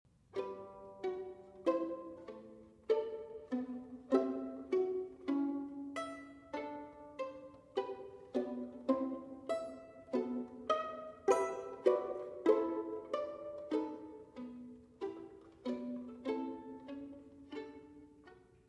Sonatas For Violin Solo, Sonata No. 4 in E Minor - II. Sarabande; Ysaye; Op. 27